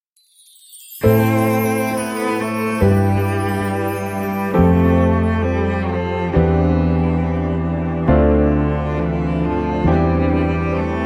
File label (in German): jesus fuer mich instrumental